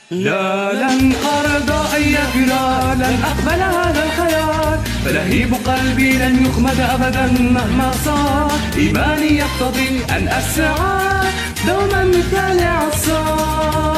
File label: jojo arab, music; GameJam-polytech